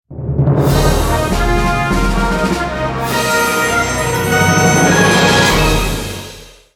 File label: bgm clear; monster hunter 4 ultimate